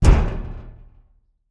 Impact Metal Electrical Box

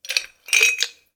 Ice Cube In The Glass; Membrans; Ice Cold Sound Pack 01; GDC Game Audio Bundle

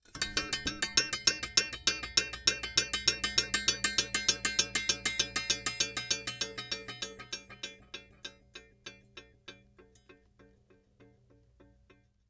Foley Metal Pole Cinder Blocks Rattle Slow

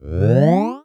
Multimedia Internet CD-Rom Flash Wipe Short

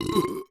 Human Male Burp

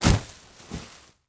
Horror Body Fall On Leaves

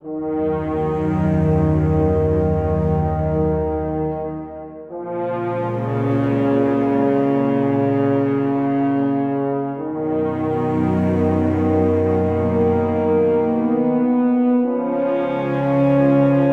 Cold Slow 74BPM, Cinematic Trailer Music

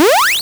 Power Up; Audition

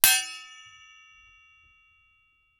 Foley Bell Metal Handbell Ring

